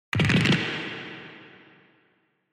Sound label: Motion Fracture